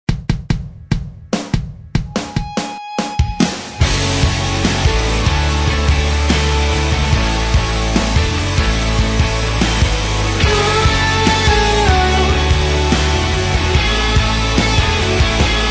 Heartbeat Rock

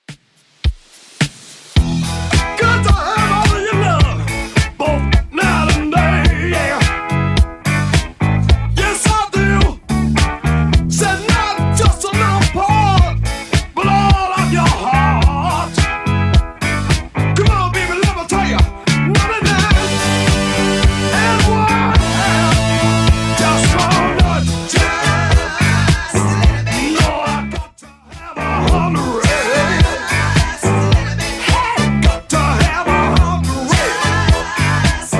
70s Redrum 107bpm

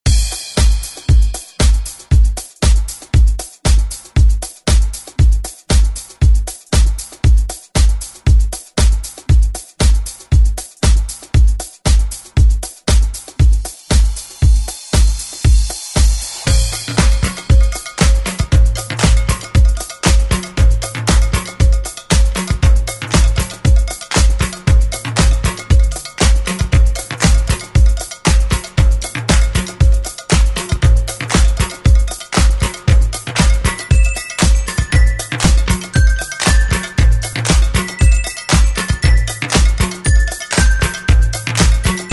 80s Instrumental Re Drum; DJ Mhark

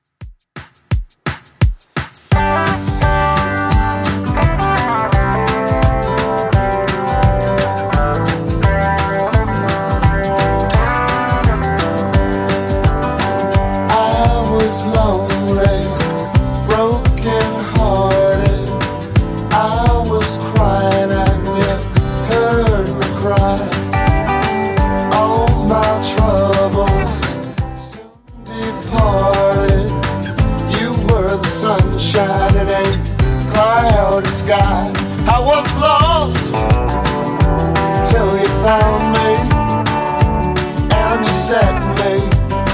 70s Redrum 172bpm

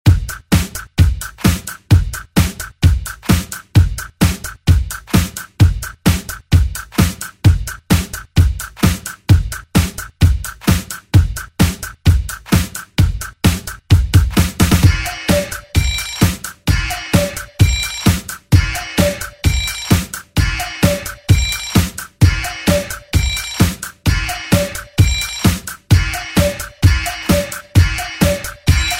Oldschool Redrum; Deejay Hit

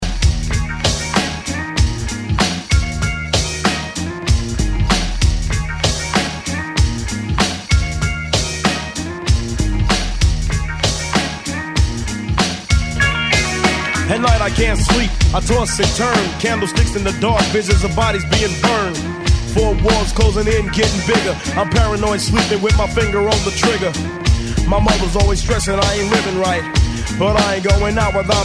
90s Intro Outro 96 Bpm

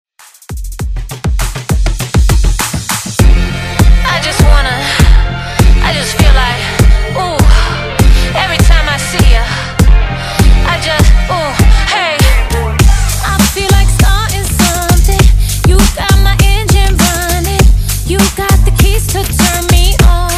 Top40

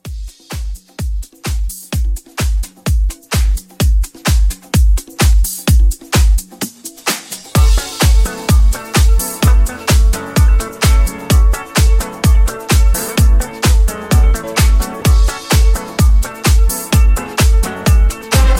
70s House Re Drum 128

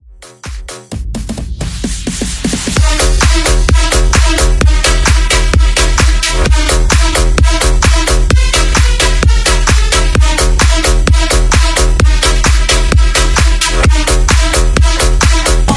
BassHouse 130

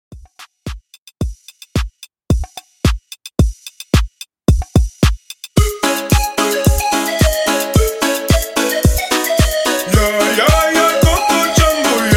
Extended Mix 110 Bpm

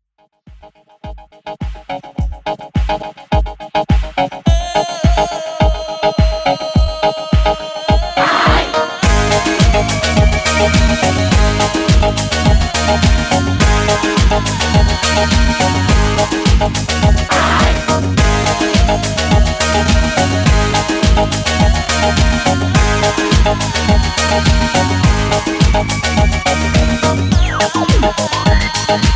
90s Extended Mix 105Bpm

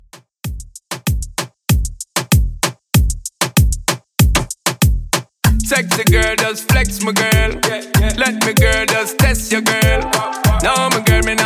Reggaeton Redrum 96Bpm

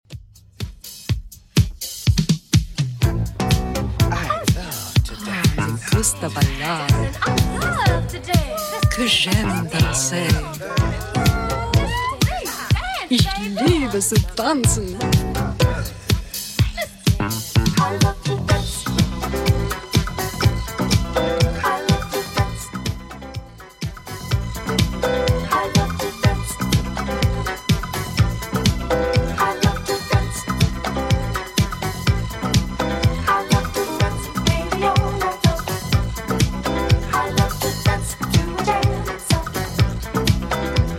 80s Redrum 124 bpm

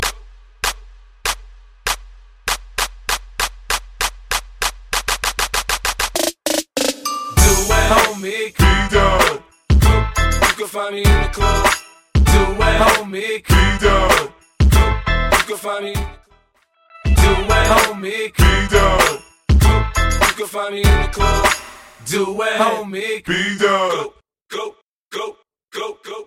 Twerk Party Starter Mash Up Moombahton 105

